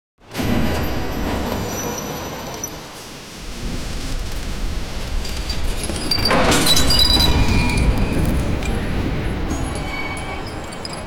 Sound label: a chaotic soundscape of; fear